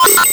error

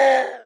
screams human

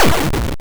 hard, explosions

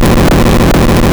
engine loop; movement

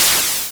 soft, explosions

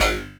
screams android

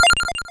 sounds pause in

